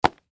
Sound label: Baseball Catch Leather Mit; Baseball (Sound Effects:Sports); Blastwave